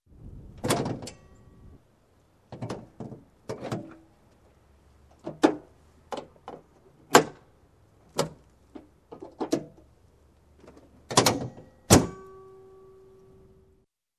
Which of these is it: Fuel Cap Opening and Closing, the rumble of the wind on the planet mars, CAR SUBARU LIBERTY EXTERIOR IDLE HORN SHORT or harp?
Fuel Cap Opening and Closing